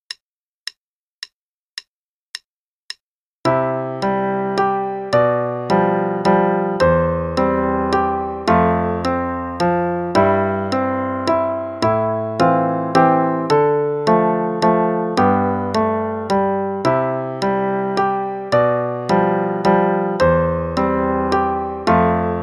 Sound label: Play along, both parts, qn=108; Faber Adult Popular 1